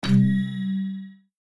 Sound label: Level Completed; disneyxd; bryx